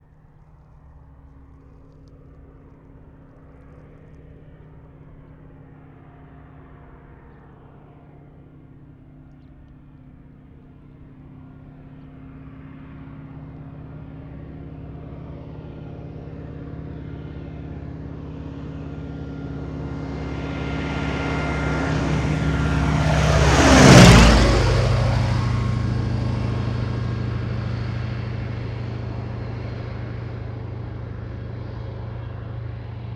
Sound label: prop plane low fast by; flyover; honoring healthcare worker with flyover 2020 may 15